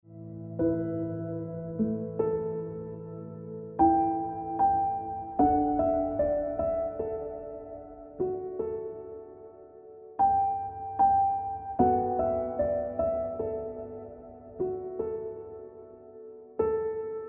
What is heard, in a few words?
Piano Music